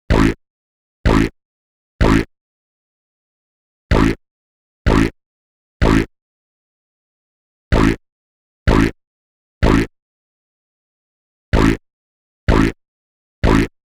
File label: 126 B#m Bass, Bpm126, Complex Electro Synths; Prime Loops; Biomass